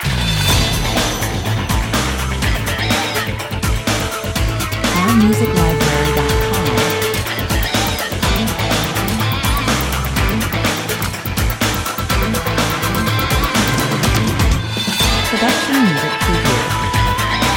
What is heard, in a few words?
modern ending (no melody) 124 bpm